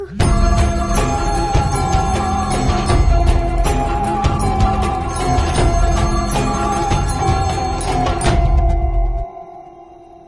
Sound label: Horror BGM, Malayalam; Chathur Mukham